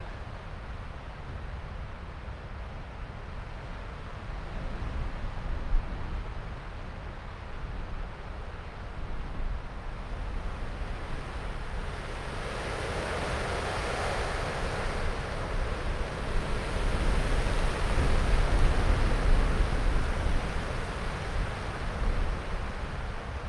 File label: snow ambience wind strong gusty; sonniss gdc